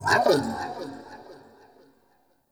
Cannonball Slam Vocal; Powers; Bromius

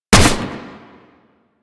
Auto Crossbow Shot; Warframe; Tenno

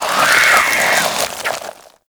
Infested Face Hugger Latch Vocals; Warframe

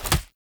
Grunt Foley Kick Impact; Weapons; Enemy

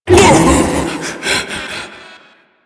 Ape Prelate Pain F; Enemies; Warframe; Proto Infested

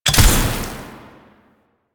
Invisibility Proj Activate; Warframe; Quests; The New War; Drifter; Abilities

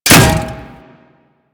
Bullet Impact Metal No Whizz; Warframe; Bullet Impacts

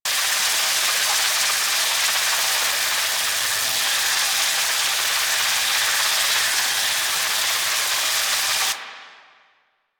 Rod Electric Loop; Warframe; Ambience; Corpus Gas City; Gameplay Remaster

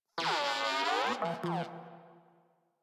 Moa Compassionate Vocal Disagree, Pets; Warframe

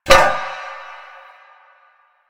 Stone Cafe Table Impact; Warframe; NineteenNinetyNine

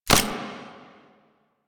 Shotgun Shot Mech; Weapons; Warframe; Pax Duviricus

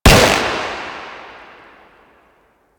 Shot Full Auto, Pistol; Weapons; Grineer; Amphis

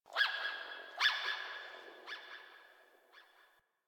Texture Bird Small; Ambience; Misc Outdoor; Warframe; Infested Eris

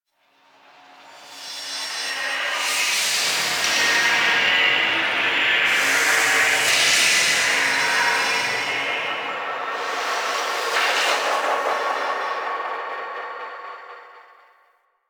Ghost Cargo Echoes; Warframe; Quests; TheNewWar; Ambience; Zariman

